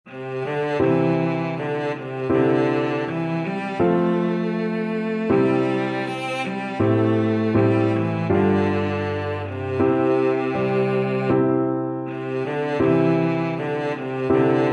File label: cello